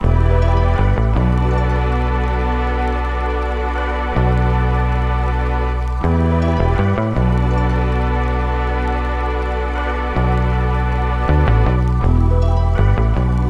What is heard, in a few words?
Melody; Cymatics; Bluff